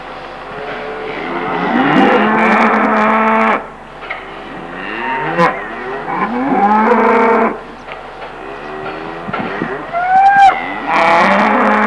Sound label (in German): brullkuh